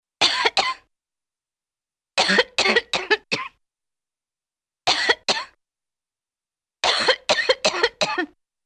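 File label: Woman with nagging Cough; Hollywood Edge; Cartoon Trax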